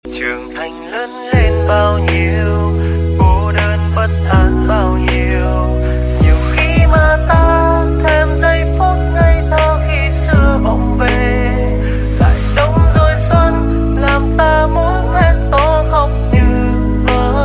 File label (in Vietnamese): Nhac Chuong Lofi